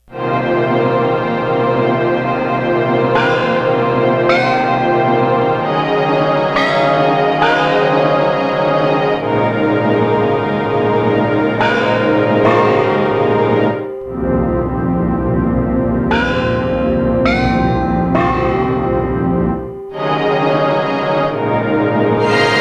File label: ORCH TONE CLUSTER OPERA GONG